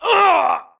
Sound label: grunt death quick; Titanfall 2